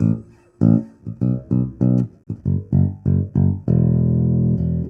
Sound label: Statement, Rock Bass Loops; ACID MUSIC